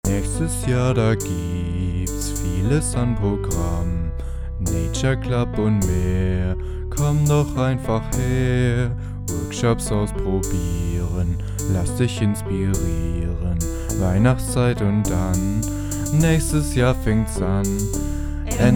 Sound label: Jingle Bells Song for Christmas